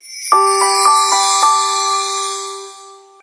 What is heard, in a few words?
Message Alarm, notification; SK telesys; SK-S170